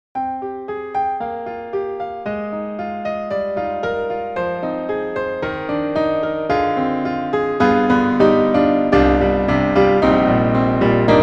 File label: Grand Pianos Bosendorfer Imperial Hall Grand; Synthogy; IvoryII